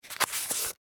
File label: Nerf Rifle Load, Foley, Rifle, Load, Dart, Toy, Gun; Toy Gun Sound Effects; Toy Guns (Sound Effects:Toys); BlastWave